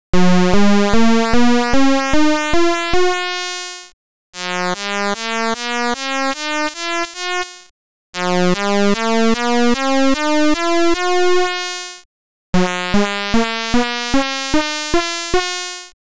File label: pwm enveloping scale